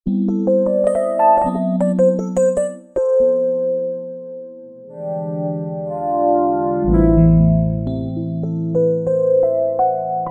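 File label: FM electric piano, V-Synth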